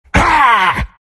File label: bearform pain; Lone Druid